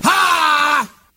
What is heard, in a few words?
ha, Voice ooh ahh